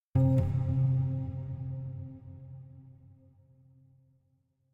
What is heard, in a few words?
Chime; chime sharp